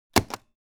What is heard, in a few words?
Rubber stamp stamping once